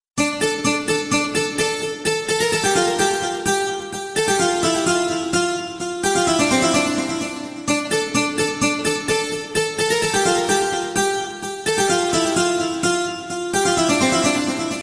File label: iphone ringtone